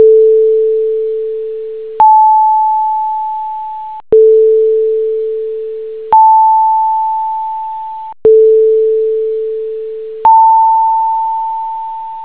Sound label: 3 octaves